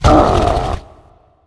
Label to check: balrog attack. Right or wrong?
Right